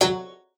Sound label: FLAG F#3, LAUTE; Xsample VOL-8; LAUTE+FLG